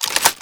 shotgun reload; weapons

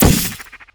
portal gun fire blue

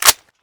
bolt forward; weapons; brenmk3

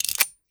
357 hammer release; weapons

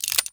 357 hammer pull; weapons

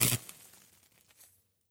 stunstick impact; weapons

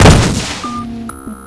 ar2 secondary fire, weapons

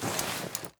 weapon movement walk; weapons

